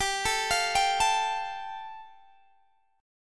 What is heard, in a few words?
G 7th Suspended 2nd, Guitar DADGAD